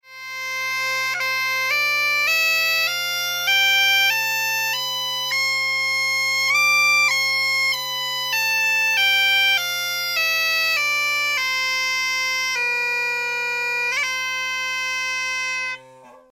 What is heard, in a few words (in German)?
gaita tonleiter